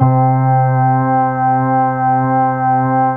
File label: Organ C4, Fairlight CMI; Keyboards